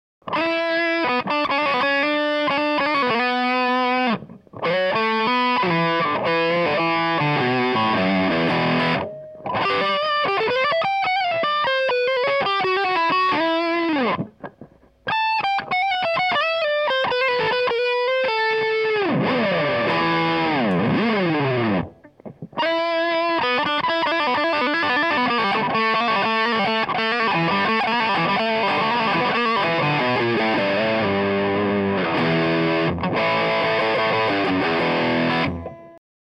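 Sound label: high gain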